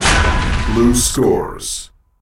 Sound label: blue capture; ctf